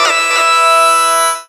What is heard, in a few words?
SYN, Roots Funk; USB Soundscan vol.41; AKAI